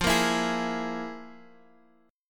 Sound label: F Minor Major 7th Double Flat 5th, F, Guitar, Open F; FmM7bb5; strummed; 17,17,16,15,x,x